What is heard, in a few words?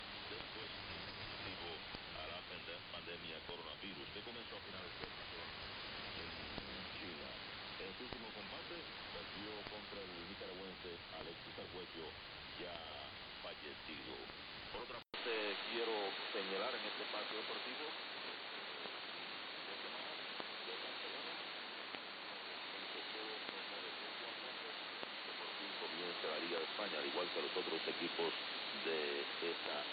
13605khz AM; Malahit DSP; Radio Marti; Afedri